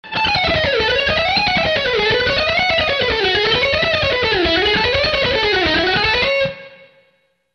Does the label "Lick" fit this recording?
Yes